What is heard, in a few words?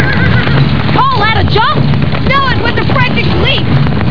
jump leap